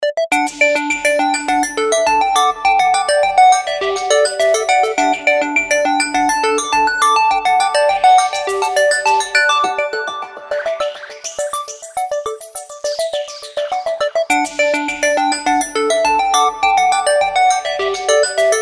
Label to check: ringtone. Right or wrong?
Right